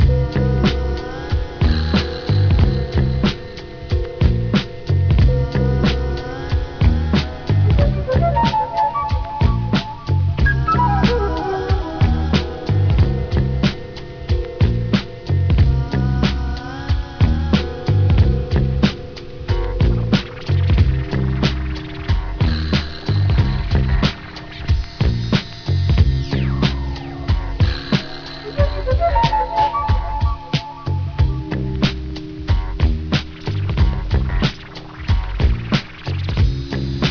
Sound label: groove